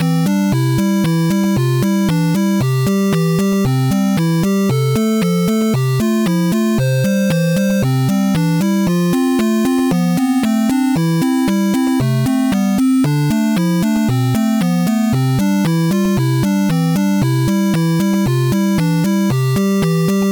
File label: town song; Pico